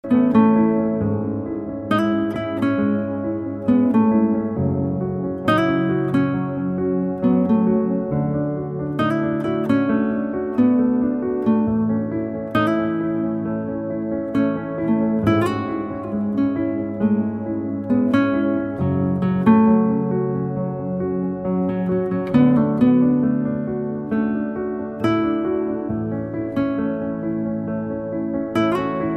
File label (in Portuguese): musica, romantic guitar song 70s